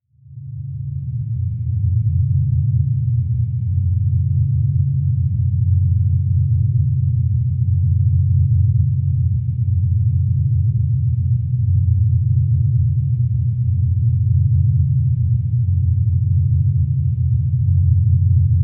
DRONE DARK HUM, Drone Dark Hum Generator Pulse Pan; Sound Design; Blastwave